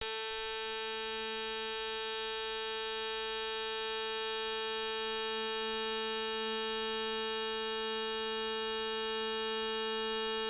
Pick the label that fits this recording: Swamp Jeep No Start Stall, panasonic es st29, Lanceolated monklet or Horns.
panasonic es st29